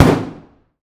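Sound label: lmg sup urb int close rear player; atmo; gmod; viper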